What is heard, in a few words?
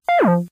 phaser Down